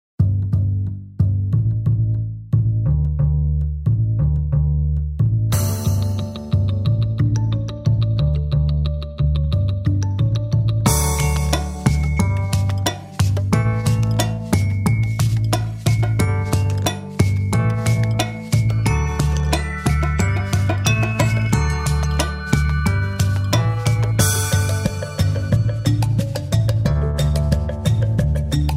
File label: Instrumental